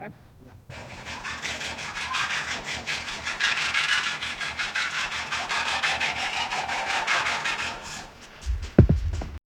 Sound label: rapid corner skidding